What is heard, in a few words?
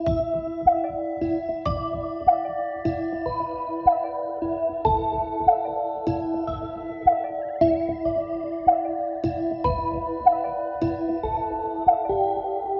75 E Music Lp